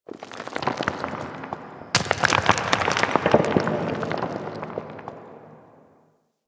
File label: Moon Dust Fall Medium, Ambience; Warframe; Orokin